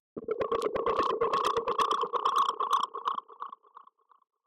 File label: Basement, Ambient; SFX; Ambient - General